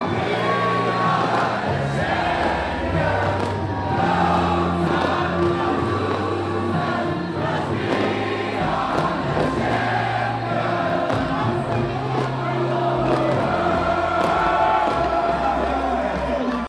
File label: Crowd Singing; World Series Braves; The Atlanta Braves won the 2021 World Series, defeating the Houston Astros 7-0 in Game 6